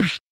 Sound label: beatbox